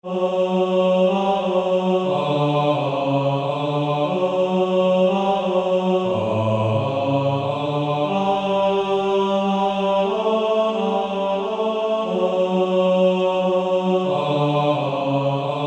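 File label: Male Choir, bass 2; Silent Night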